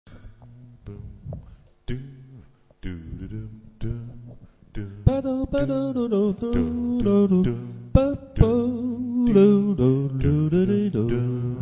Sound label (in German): Duett